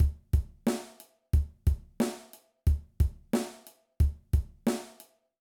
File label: Basic Beat Example, drum